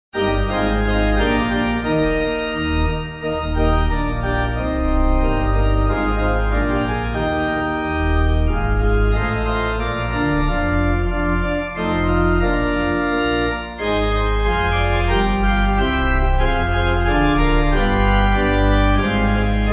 Pipe

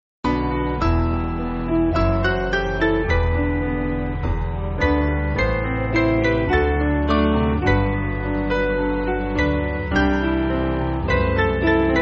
Piano

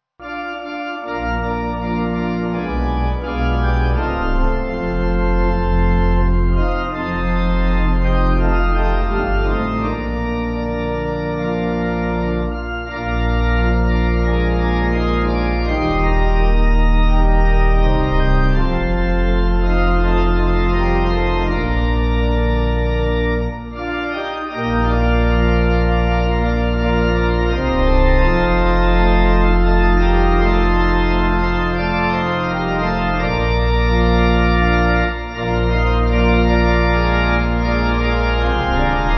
Pipe

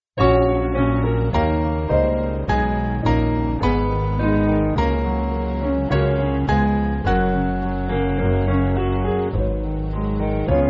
Piano

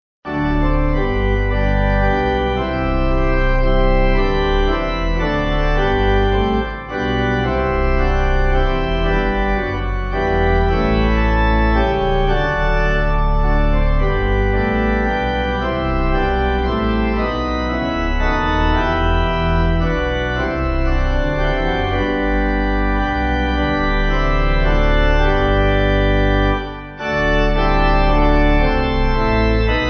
Pipe

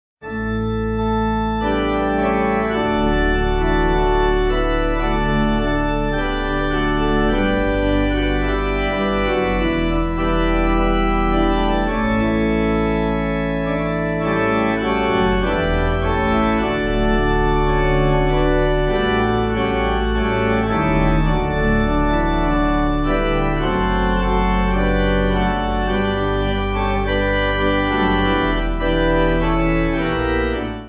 Pipe

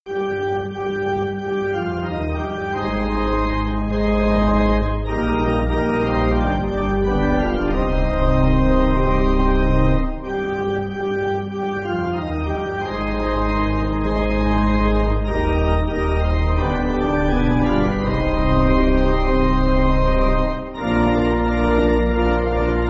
Pipe

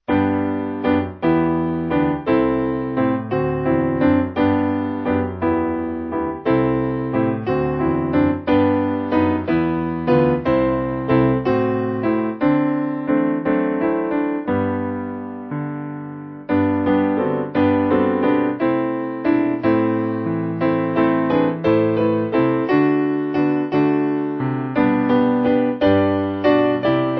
piano

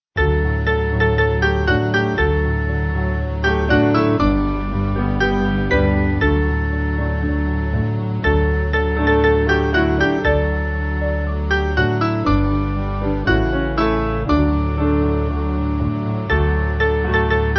Piano

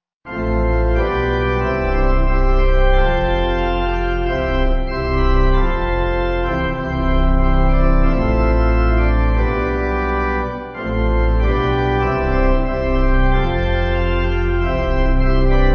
Pipe

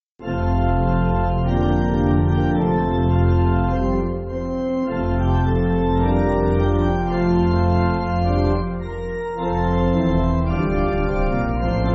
Canon, Pipe

